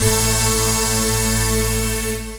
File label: techno syn A, synth notes; Techno Trance Essentials